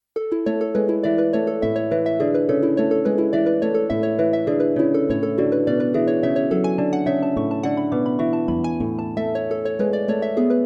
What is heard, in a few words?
harp interval, Ketron AUDYA; bati ses ve ritim tanitimi